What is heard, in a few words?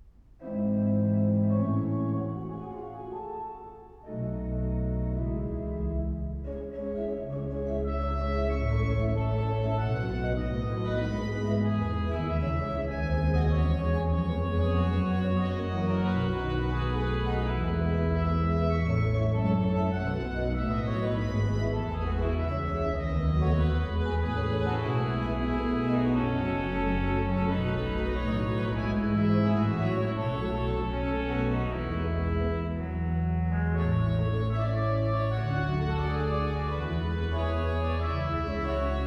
Canons for Pedal Piano, Andantino; 6 Canons for Pedal Piano op. 56